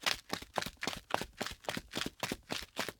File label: Footsteps Loafers; LOOPERS